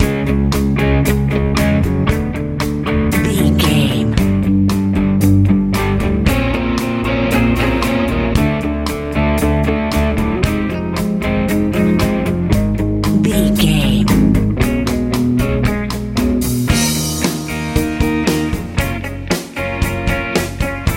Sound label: pop rock